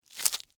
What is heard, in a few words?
Sticky Rip Tear, Texture, Sticky, Rip, Tear, Rubber, Gloves, Movement; Cleaning Sound Effects; BlastWave